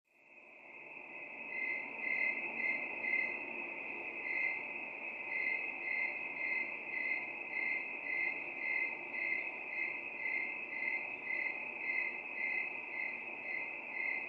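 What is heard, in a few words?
Crickets Night Ambience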